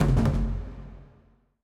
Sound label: quick stop; serengeti kings